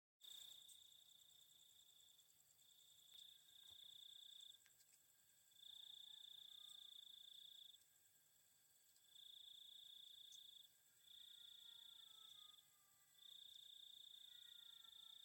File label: night